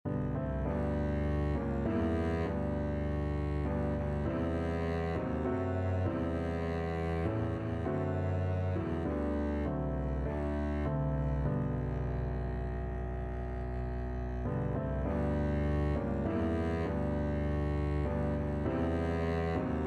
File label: rugged cross, Double Bass